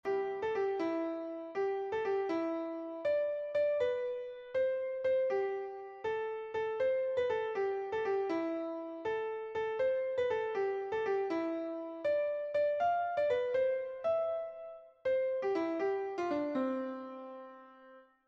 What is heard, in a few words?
Silent Night Piano